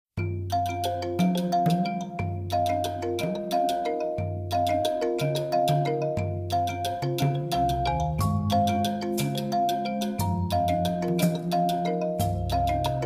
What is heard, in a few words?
Grasslands Chant Accompaniment